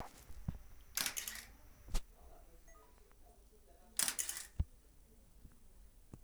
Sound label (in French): son declenchement hasselblad